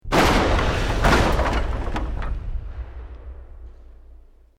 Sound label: EXPLOSION MEDIUM WOOD